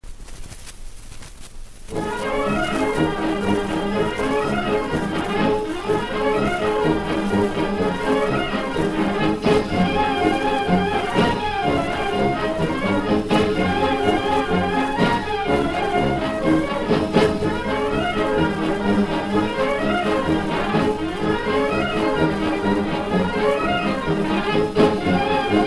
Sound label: start of The Flamborough Sword Dance; ClickRepair